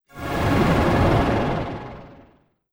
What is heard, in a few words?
music chest signal, Cartoon Film Effects